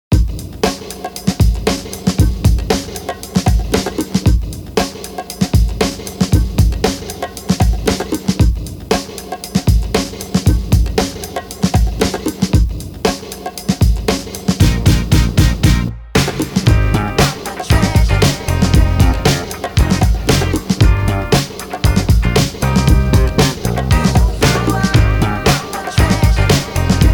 bpm 107

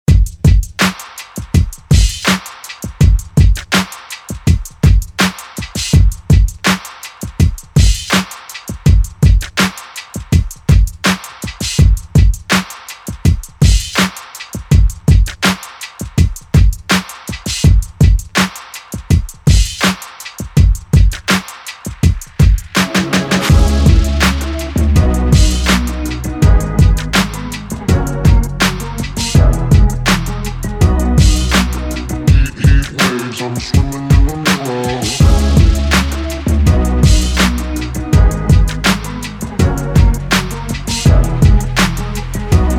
bpm 82

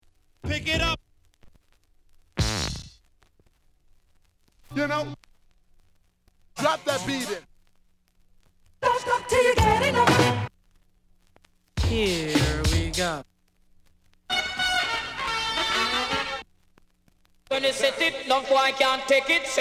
samples scratches

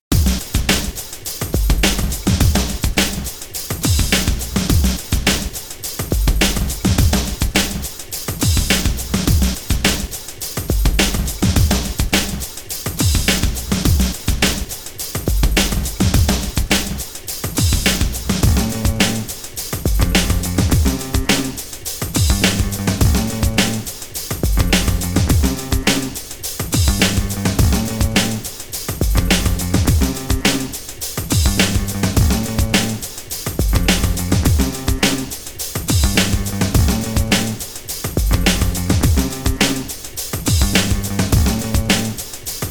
loop track break

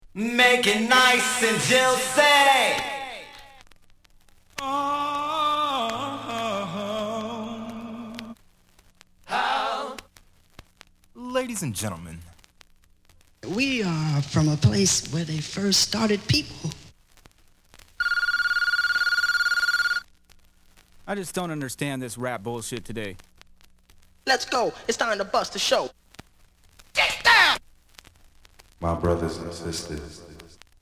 samples scratches; wicked mix vol 019

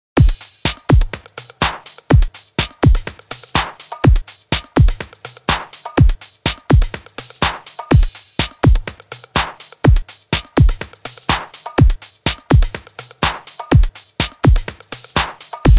instrumental bpm 124

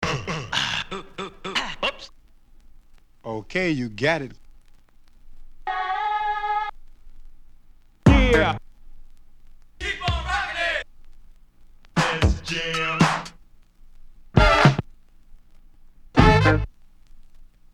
samples scratches

